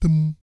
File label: BASS THUM; Spectrasonics; Vocal Planet; Jazz FX